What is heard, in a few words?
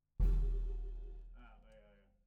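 SNR Bot, snare bottom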